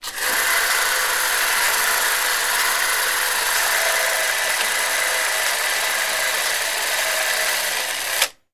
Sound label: 16mm Video Camera; movie & projectors